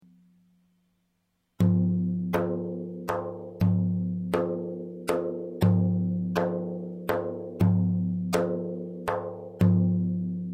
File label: tar, frame drum; notations